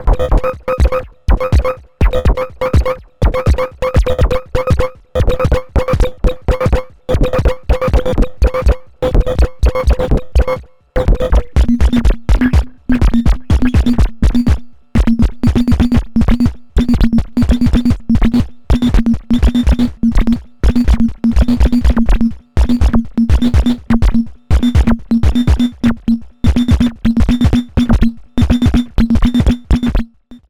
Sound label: Drums Liquid Glitcher COMP und Pitch per LFO; Error Instruments